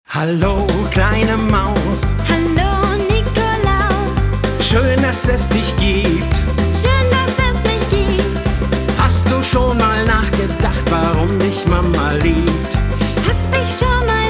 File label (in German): Weihnachtslieder